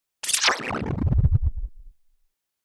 Descend High Frequency Descend with Warble, Distortion